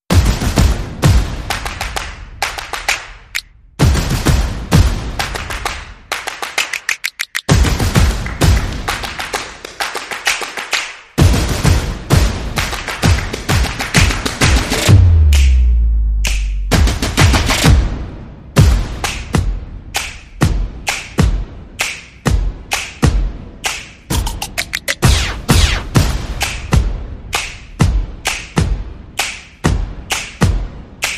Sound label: Percussion and Effects